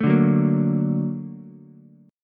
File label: Bb Augmented Major 7th Bb down Ukulele; Bb+M7 strummed; JGuitar